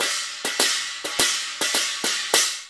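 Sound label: CHINA, cymbal; Spectrasonics; Heart of Asia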